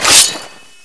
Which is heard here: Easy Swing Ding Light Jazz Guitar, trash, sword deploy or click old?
sword deploy